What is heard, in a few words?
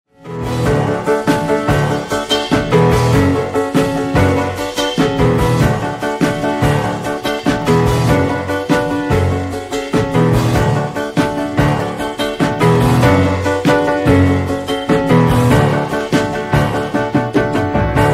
piano and drums session